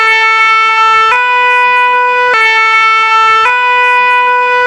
sirens french; vsav-ar

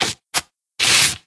Tear Paper; blue archive

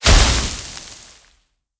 Sand Blow; blue archive

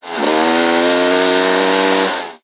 Vehicle Engine; blue archive

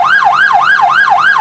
yelp, sirens

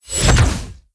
Drone Spawn; blue archive

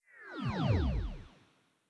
Gacha Result R; blue archive

